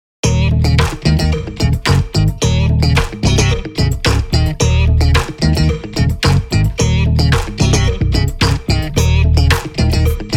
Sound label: Funk dance; MIUI12